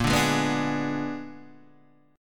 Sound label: A sharp 7th Flat 9th, Guitar Open D chord